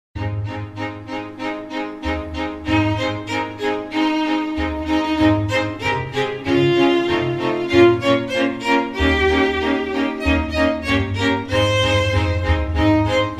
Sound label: jingel